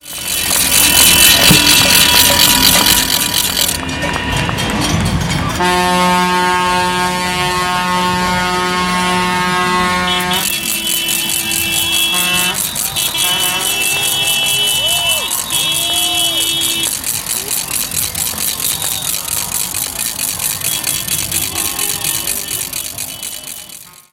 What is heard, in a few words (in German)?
traktoren; wir haben es satt